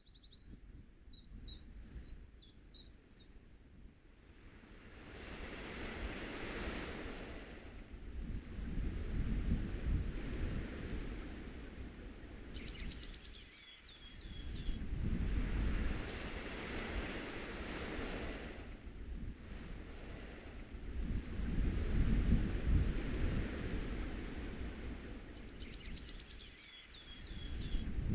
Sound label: Strange reggae